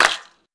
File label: normal hit clap